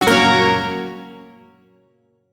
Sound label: Shawzin Hirajoshi Chord; Warframe; Duviri